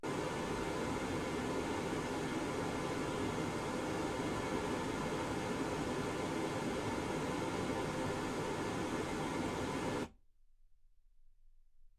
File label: pink noise 48kHz